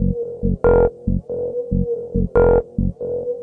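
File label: ober tune, 140 bpm; SYNTH AND ELECTRONIC LOOPS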